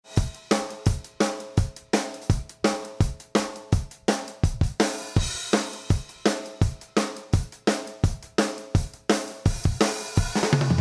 Drums Plus Snare bypassed

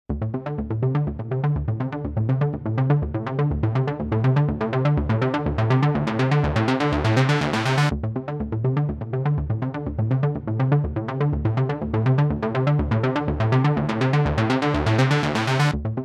Sequencer bypassed

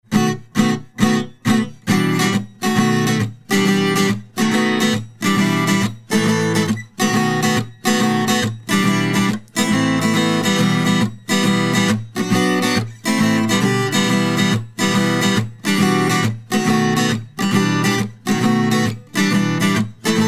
Acoustic Git active; skulpter

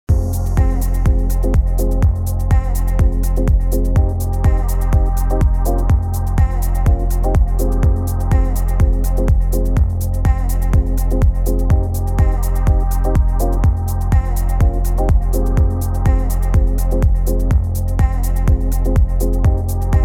Mix Buss Dub bypassed; xpressor

